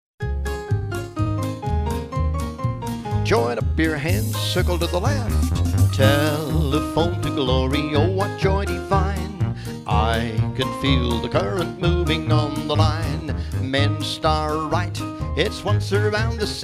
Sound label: royal telephone vocal, square dance